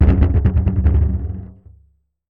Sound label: Marbles & Framedrum; contact mic; musicradar; contact mic madness; Raw